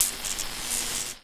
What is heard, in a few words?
electrical discharge